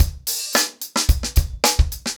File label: 110BPM, acoustic drums; The Roots Drumloops